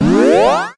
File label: power up; Soldiers Combat